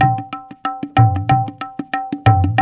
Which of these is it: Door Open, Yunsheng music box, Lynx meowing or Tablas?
Tablas